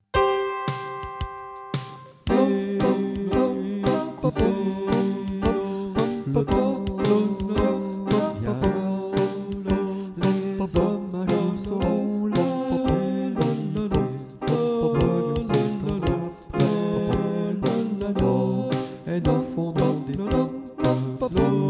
canon tous; chants

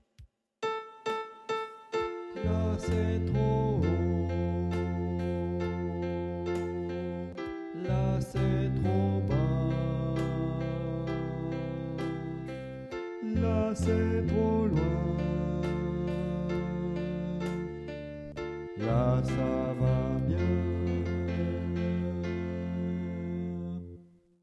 cavabien sop, chants

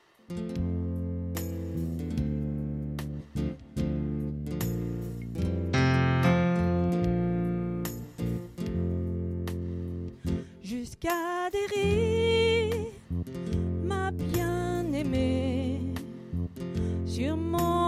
alto; chants

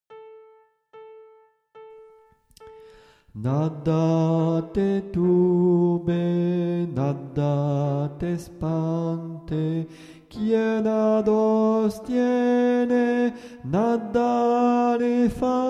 nada sop, chants

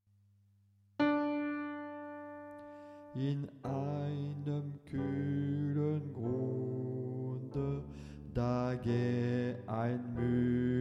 homme; chants

